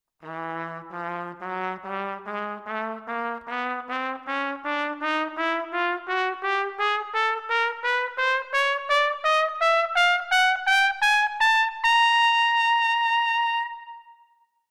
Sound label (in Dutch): standaard bereik trompet